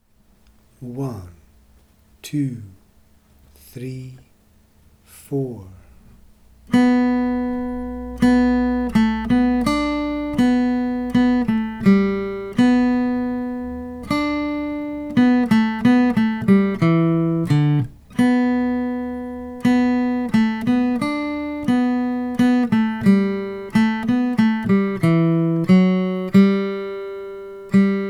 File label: Waiting For The Federals Melody; A part